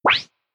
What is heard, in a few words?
sound button click; solvable-sheep-game